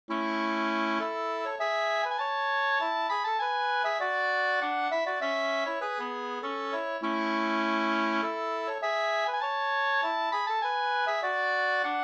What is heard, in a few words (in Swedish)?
polska Bodapolska